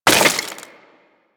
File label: Melee Impact Wood; Warframe; Weapons